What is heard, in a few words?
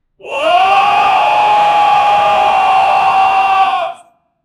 short thick shouting; brief